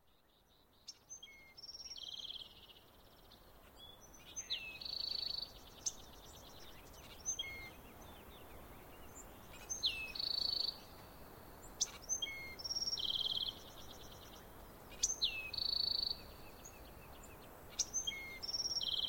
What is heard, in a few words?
easter sparrows